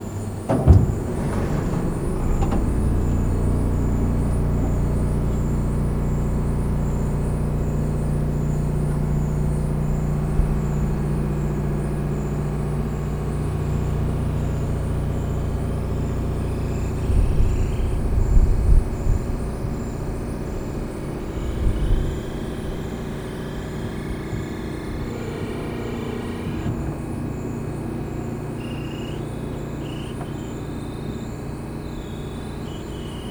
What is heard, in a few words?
Soundscape: Paranal Auxiliary Telescopes (ATs) dome opening